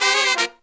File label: 132, Pop & Funk Brass